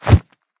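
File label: sharp forceful swing of a bladed weapon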